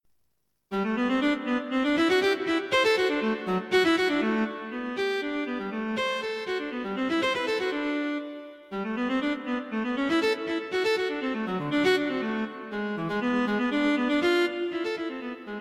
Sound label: Cello; Cello Solos